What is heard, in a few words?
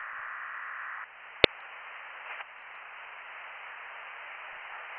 from radio, 7177KHz